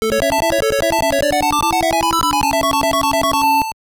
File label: Jingle; Pocket Densha; sgb